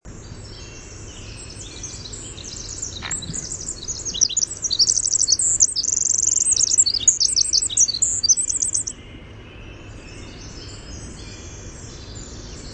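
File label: Winter Wren song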